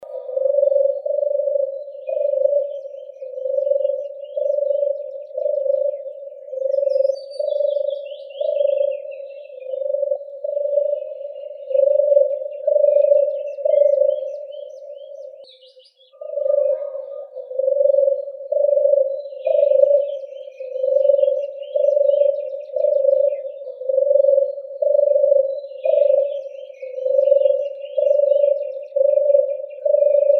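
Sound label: common turtle dove, Streptopelia turtur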